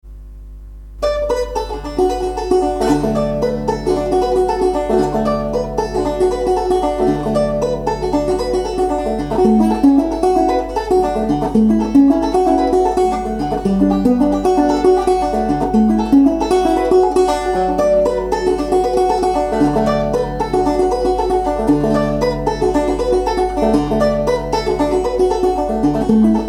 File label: Cool creek march